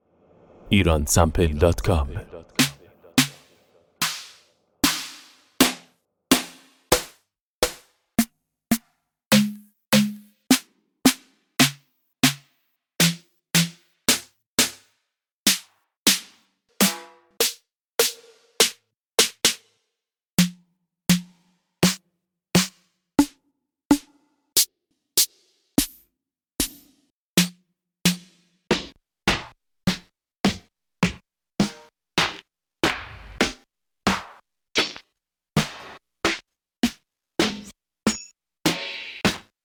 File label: analog snare